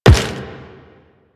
Step Metal, Footsteps; Warframe; Quests; The New War; Kahl

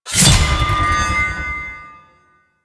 Plunge Ability Cast; Enemies; Abilities; Warframe; Duviri; Duviri Dax; Dax Knight

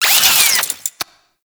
Necramech Repair Drone Idle Long; Enemies; Warframe

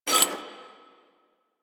Glyph Eye Open; Warframe; Duviri; Glyph Puzzle

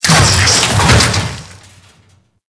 Necramech Ranged Hit React; Enemies; Warframe

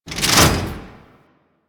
Traps Turret Door Open; Ambience; Warframe; Grineer Fortress

